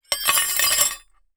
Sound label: Metal; TOOLKIT vol.2